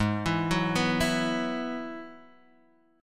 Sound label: G sharp Minor 7th Sharp 5th G sharp; G#m7#5 arpeggiated; x,x,x,1,4,1,1,1; JGuitar